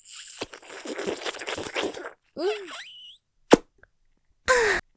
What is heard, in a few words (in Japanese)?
お掃除フェラ, ちゅるじゅる系舌使い→ぷはっ, ASMR; ループで楽しむ！シェフの気まぐれ抜き抜きコース